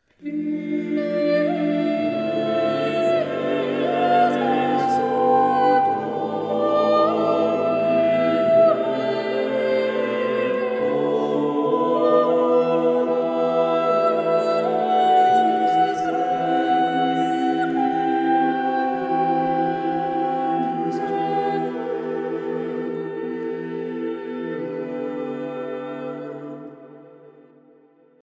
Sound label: Angelic Requiem 1st Soprano Soloist